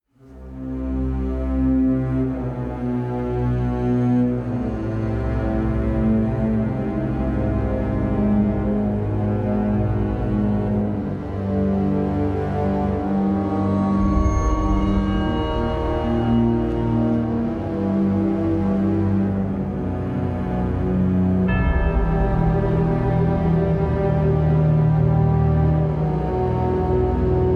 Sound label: Ukulele Walk; Get Out